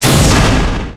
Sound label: impact mech; Hits, Impacts